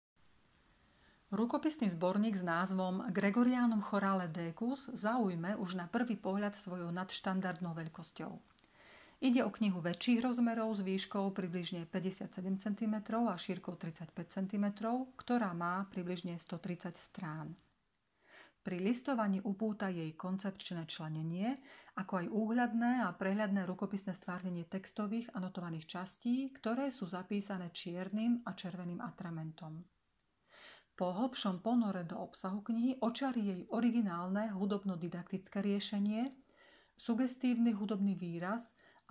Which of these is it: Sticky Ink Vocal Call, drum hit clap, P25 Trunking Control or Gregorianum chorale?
Gregorianum chorale